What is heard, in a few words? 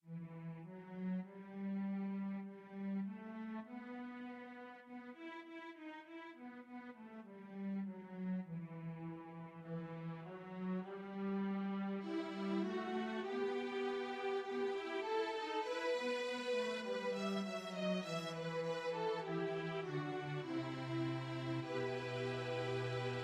String Quartet